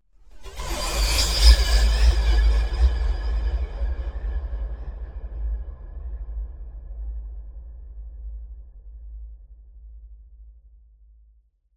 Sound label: Whoosh Sharp Echo - Transition; Hollywood Edge; sound designer's toolkit v2